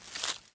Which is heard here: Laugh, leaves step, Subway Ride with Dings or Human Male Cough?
leaves step